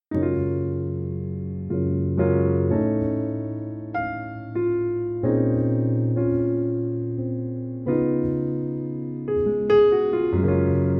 Piano Version

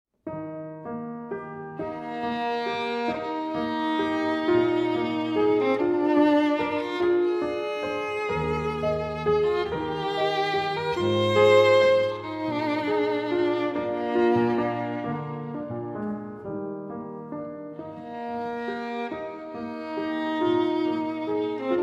Sonate Für Clavier Und Violine, Adagio; Bach

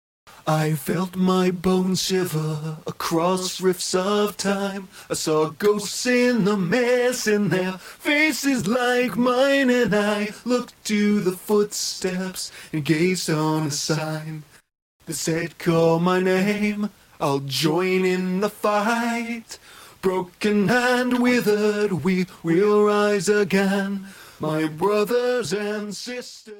Vocal Stem

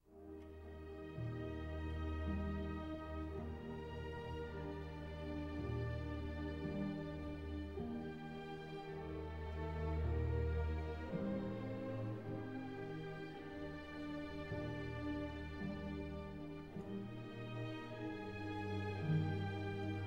Piano Concerto II. Andante Con Moto

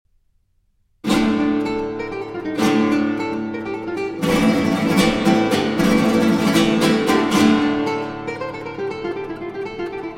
Sonata For Guitar Solo - 3. Allegro Vivo; Op. 61; Turina; Allegro Moderato

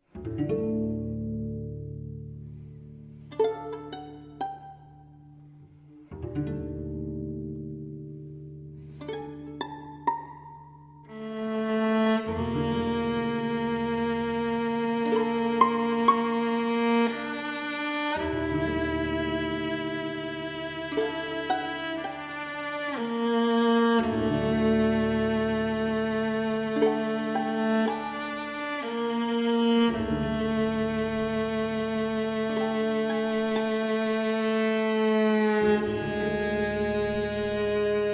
String Trio Adagio

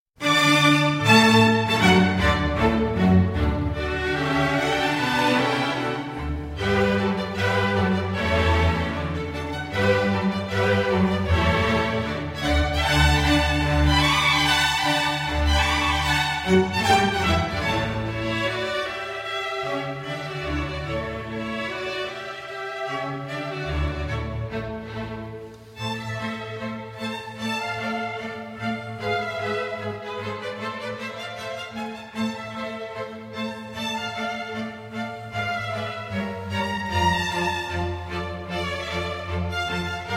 Cello Concerto in D Major Allegro

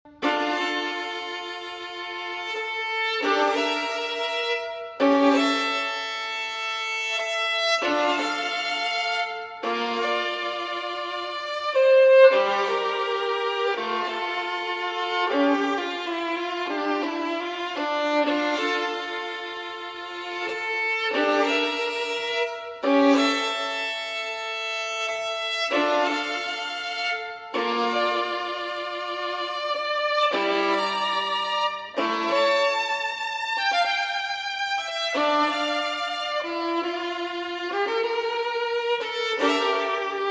Violin Partita in D Minor, Chaconne; Bach, J.S.

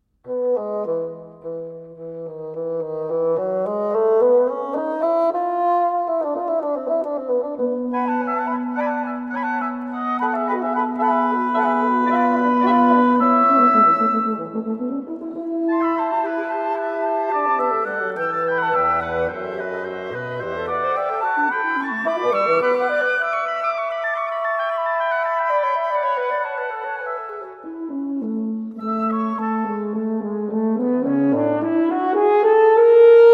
Wind Quintet; Op. 43; I. Allegro ben moderato

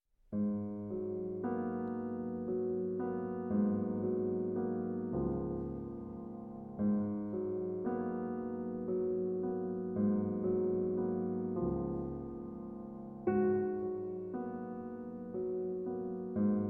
piano; Isaacs Preludes For Piano, Book 2; 10. Barcarolle

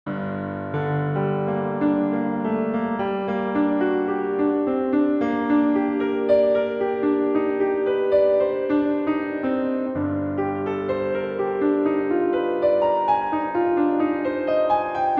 Solo Piano, Andante Sostenuto; Piano Concerto No. 2, Op. 22

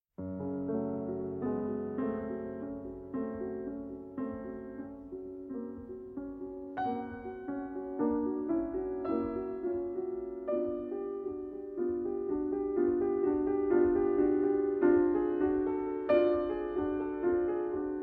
Preludes in F-Sharp Minor

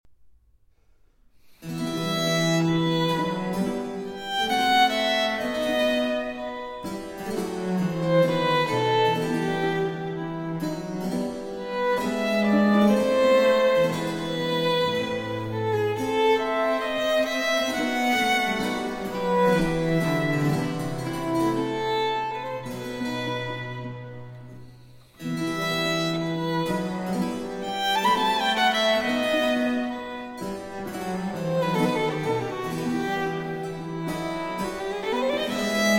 Sonata For Violin & Continuo in E Minor, Largo

